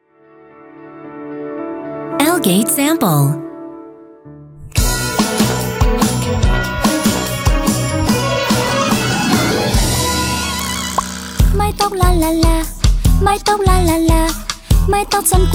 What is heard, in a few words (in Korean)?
베트남 여 노래; 뽀로로